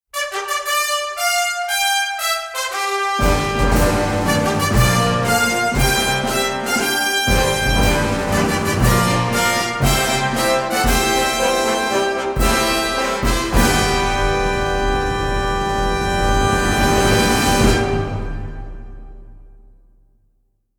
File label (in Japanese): チャンピオンズミーティング 決勝ファンファーレ; umamusume pretty derby winning live 12 2023